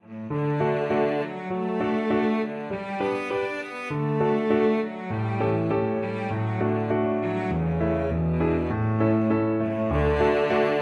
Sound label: peasant, cello